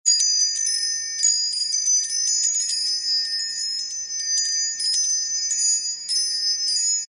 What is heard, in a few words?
chime metallic wind